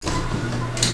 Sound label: airbrake; Flying Nightmares Demo; Flight Sims